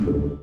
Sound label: button; starcraft